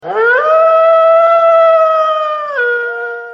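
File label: Scary Wolf Sms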